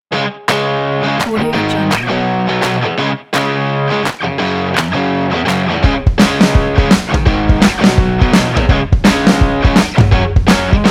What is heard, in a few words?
upbeat indie promo rock